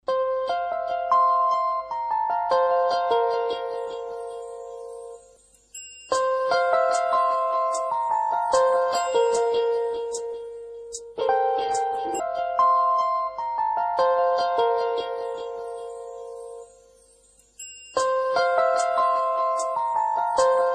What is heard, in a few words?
Instrumental Tamil